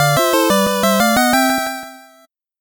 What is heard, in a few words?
8bit jingle fast